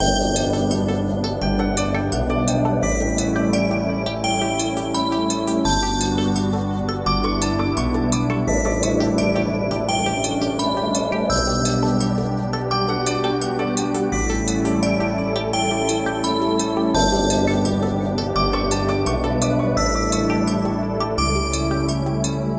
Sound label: Space Game Theme Loop